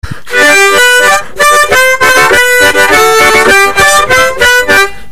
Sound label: Octave, harmonica